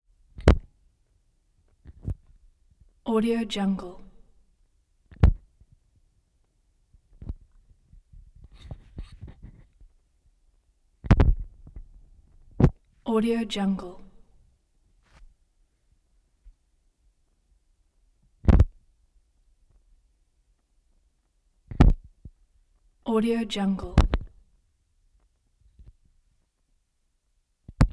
microphone drop audio signal live punchy pops; prosoundfx

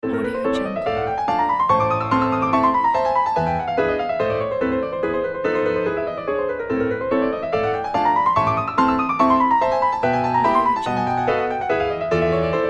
Chopin Etudes op 10 no 2, classical piano

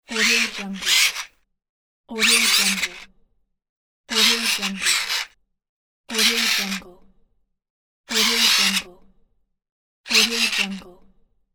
small robot gears

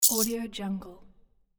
casino chips; audioheart

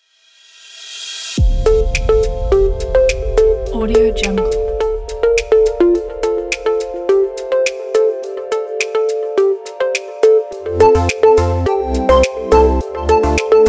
inspirational tropical house

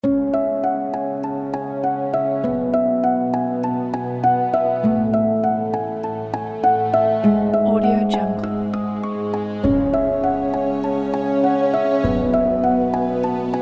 suspense

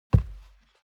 foley step barefoot wood plank single trample; smartsoundfx

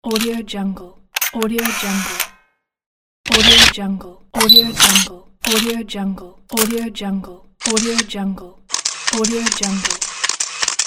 camera sound pack

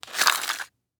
chalk box slide

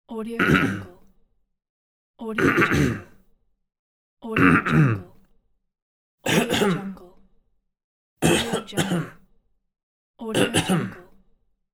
clearing throat coughing

